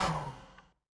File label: L-39 Pitch Trimmer End; DCS